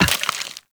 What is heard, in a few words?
Walk Bone; footsteps; Warframe; Operator